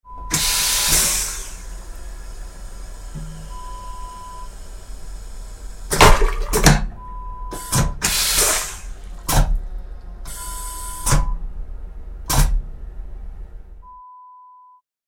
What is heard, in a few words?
train toilet flush sound